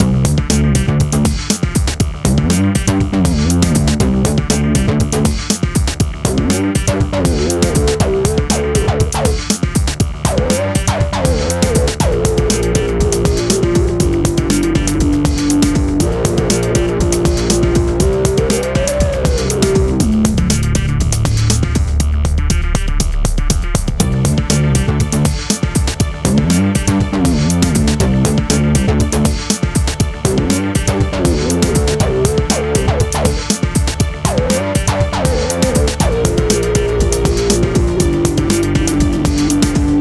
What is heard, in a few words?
Begin Game; scania truck driving simulator macos windows gamerip 2012